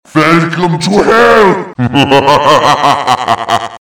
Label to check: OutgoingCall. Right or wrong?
Wrong